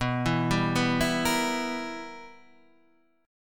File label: B Major 7th Suspended 4th Sharp 5th, chord; BM7sus4#5 Chord {7 7 5 4 5 6} arpeggiated